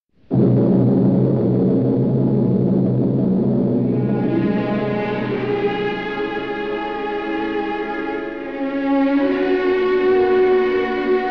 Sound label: music; Shock Corridor